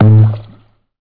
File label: Bonk; Let's Discover Michigan!